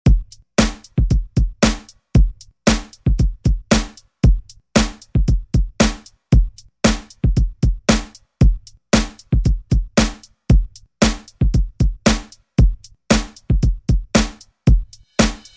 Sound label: Hit Rock Redrum; Deejay Hit